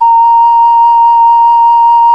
OCARINA; World Combo

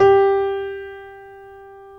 G3 PNO.FOR, G.PIANO; AKAI S-Series CD-ROM Sound Library VOL-7

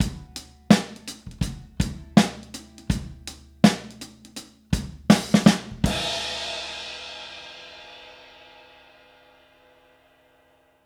Soul Drums Ending, 70s Analog Funk; Sonic Foundry (Sony Creative Software); What it Is!

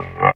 DR. LOOP, DRUMS; X-Static Goldmine 3

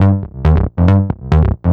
bass 138 g, bass-licks, Techno; instrument-loops

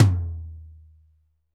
tom, Pop Country; Northstar; Drumscapes Roland

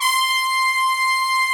BRASS; Greytsounds; Scott Peers Sound Engineering

